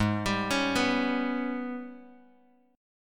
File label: Ab Minor Major 11th Ab, Bass Standard; arpeggiated; JGuitar